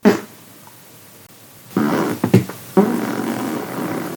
fart again

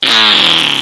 loud fart

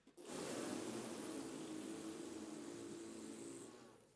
long fart

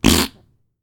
fart sound

